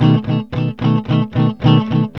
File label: ROCK B 110, Vintage Blues Guitar; USB Soundscan vol.22; AKAI